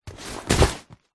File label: foley luggage bag rucksack set down hard